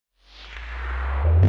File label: AirFX